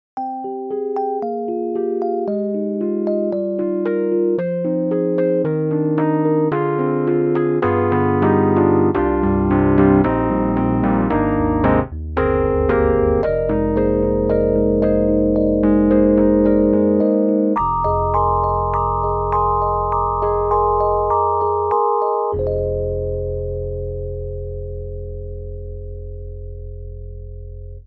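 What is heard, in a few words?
Stage electric piano Hard; IK Multimedia; SampleTank3; Stage EP Hard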